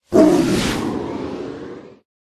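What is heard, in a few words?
manticore death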